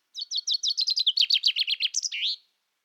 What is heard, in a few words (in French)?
pinson scriew, pinson des arbres; Gaston Gast